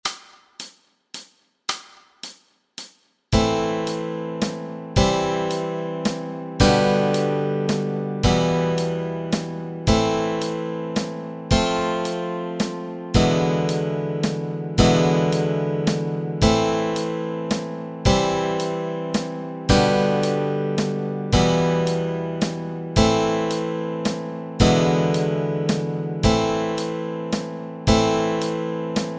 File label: What Child is This chords, Easy Guitar and Ukulele